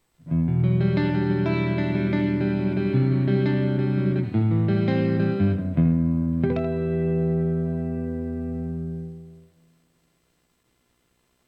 pickup mini humbucker clean full; mh1 2 mini humbucker dual rail; panico pickup

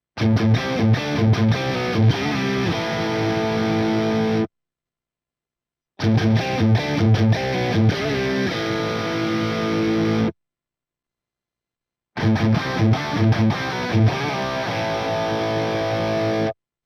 Crunch HB1 Humbucker, pickup; panico pickup hb1 humbucker

